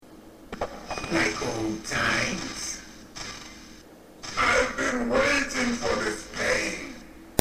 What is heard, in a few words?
pain; metal gear solid